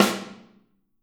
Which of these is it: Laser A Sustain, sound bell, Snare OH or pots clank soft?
Snare OH